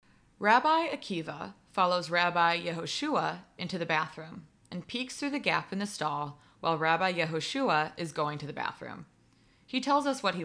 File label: Laugh Track; Joke